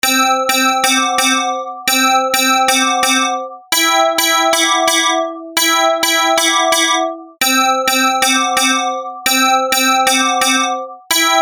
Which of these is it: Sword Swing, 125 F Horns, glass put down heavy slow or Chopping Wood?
125 F Horns